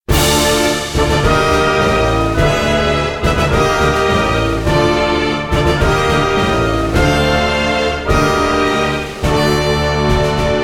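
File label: background music dungeon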